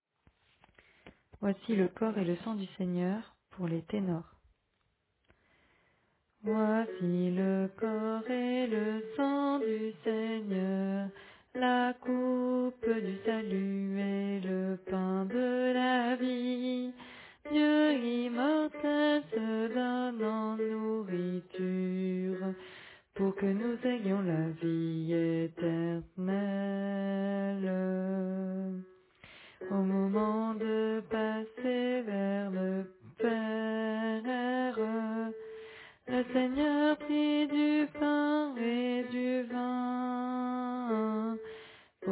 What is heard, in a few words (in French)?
Tenor